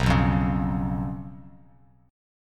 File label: B Suspended 2nd Flat 5th B Bass Standard; strummed; JGuitar